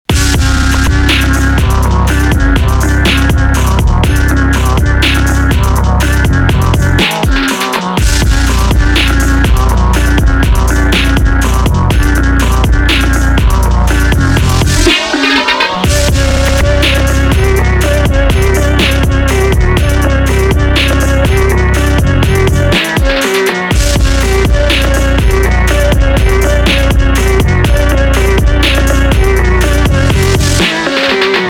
PHONK

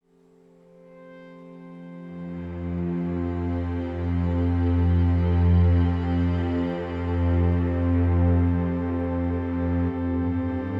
Music For Dogs, Sleepy

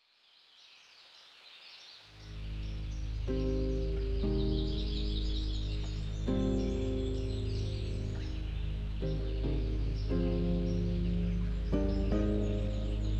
Ambient Background; Forest

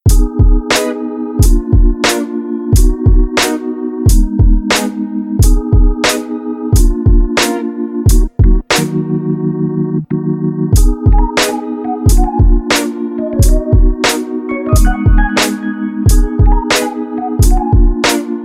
Instrumental

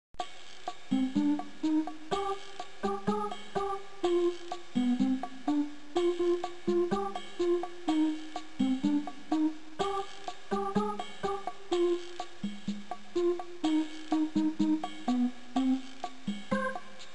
Piano Tango

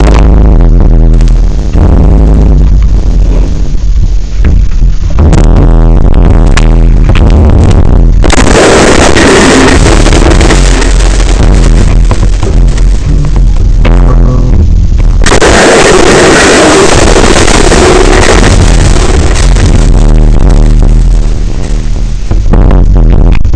Tribal

